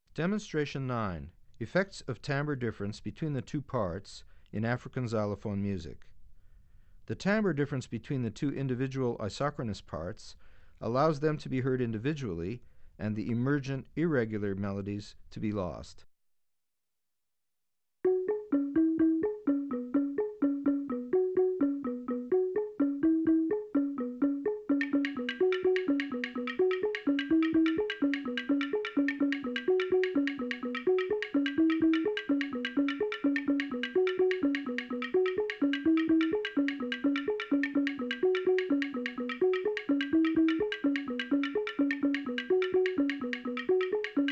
Effects Of Timbre Difference Between The Two Parts In African Xylophone Music; Bregman ASA; auditory demonstrations